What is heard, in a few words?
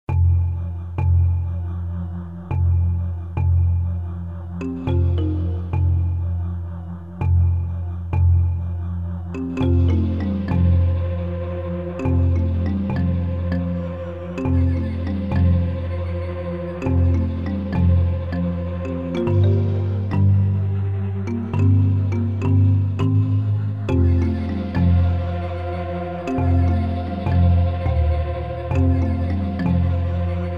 africa; soundzabound volume 1 disc 3